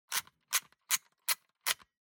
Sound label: Camera Disposable (Wind)